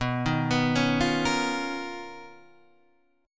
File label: B Major Sharp 11th, Guitar, Half Step Down; BM#11